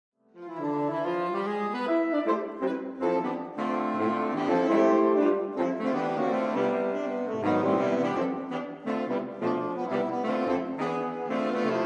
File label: Sax Quartet; Saxsational